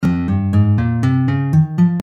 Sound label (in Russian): Naturalnyj Fa minor